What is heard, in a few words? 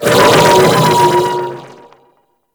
Vocal Attack; Warframe; Amalgam Override